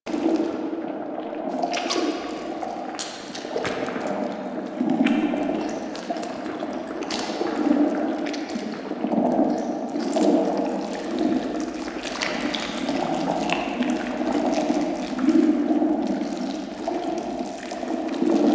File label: Interior Brain Tunnel Loop, Ambience; Warframe; Infested Eris